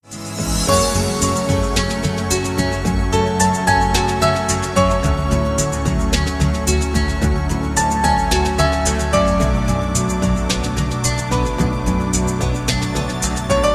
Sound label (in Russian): Krasivaya Muzyka Pianino